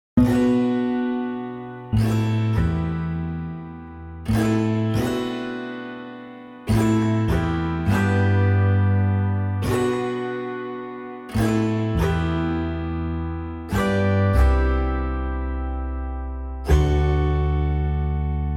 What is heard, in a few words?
autoharp and taishogoto; low tide